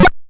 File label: Sound effect of Gold Ball Shoot; NSMB2